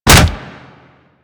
Kick Hit Default; Melee

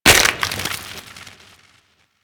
Dart Pistol Mech; Warframe; Weapons; Infested

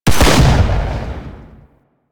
Shogun Shot D, Shotgun; Weapons; Warframe; Tenno; Alchemist Shotgun

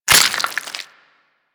Proc Bleeding; Mods

